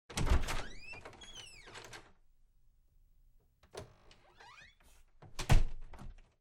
open; WMBonusXP

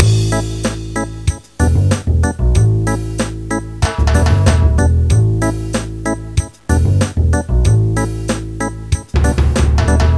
Menu BGM; Erect Magazine 6

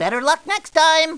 lose; McDonaldland - Silly Games; McDonald's; tictac

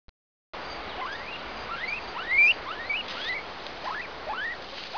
PUPPY; Talking E-Mail

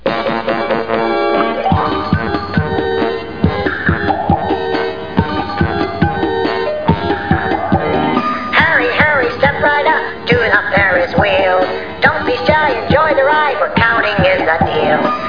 Ferris Wheel Song; How Many Bugs in a Box

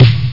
bass drum

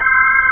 Hi Fast F#4, B3; SoundFont - The Developer's Choice - Volume 1; b3fast

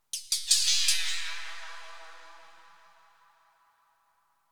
drum loop, ambient, 160; eJay

